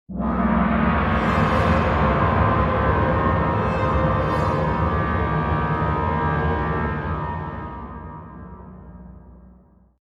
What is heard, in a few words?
Play Stinger; Mecha'Thun